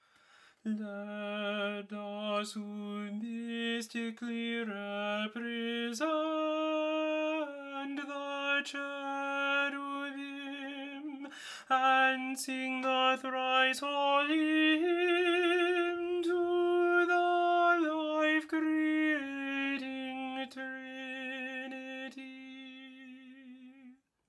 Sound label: Cherubic Hymn Tenor